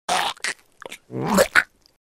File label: retches